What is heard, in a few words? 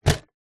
office felt tip pens pencils other stationery in plastic tub set down wood surface; zapsplat